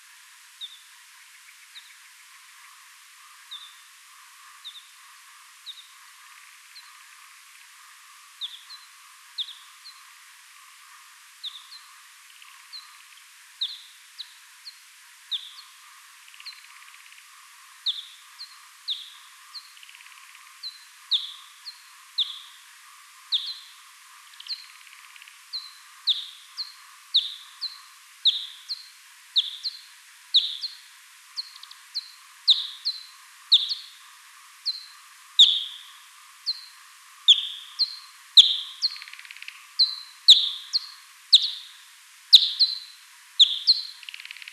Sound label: P kuhlii FM sigmoide expansion x10; MaineLoire; L-Bellion